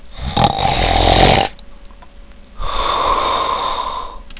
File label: syn snore